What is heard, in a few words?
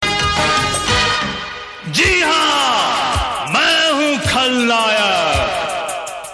Msg Tone; Khalnayak